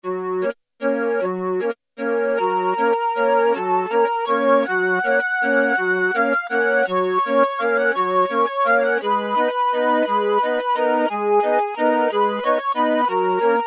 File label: Calliope, Roland Juno-106; Factory Preset